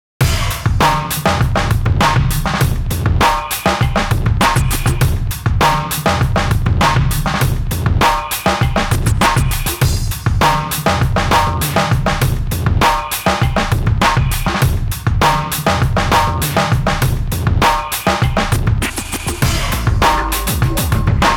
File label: Beats 100; Cyril; AMG - Ruff Cutz